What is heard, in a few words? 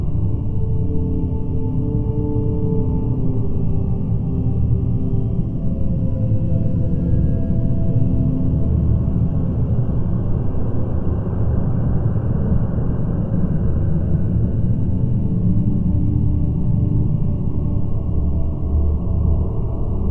Amb Night Loop; moul